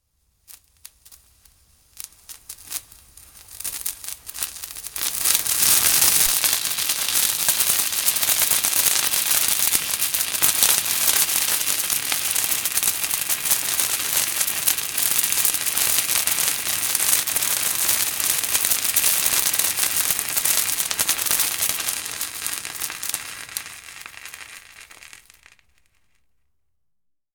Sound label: Fireworks, Crackerjack Fountain; Firework Sound Effects; BBC